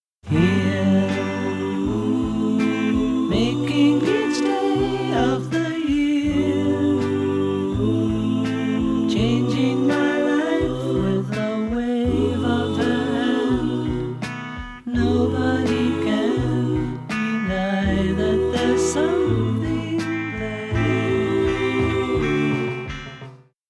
backing vocals

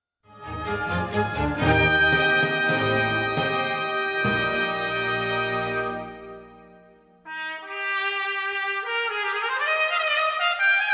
cadenza

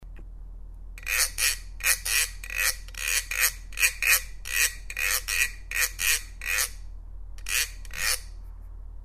guiro

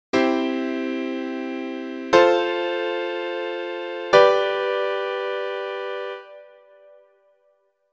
chord prog 1 4 5

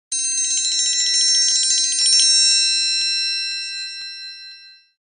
triangle

